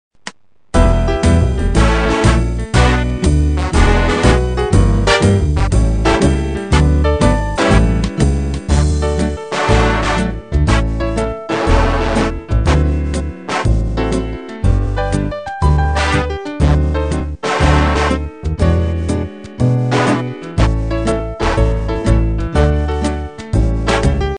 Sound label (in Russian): джаз минус